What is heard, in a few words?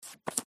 card deal; new-years